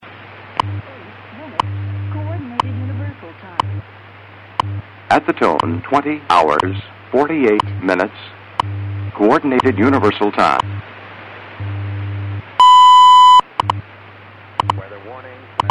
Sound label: utc wwv audio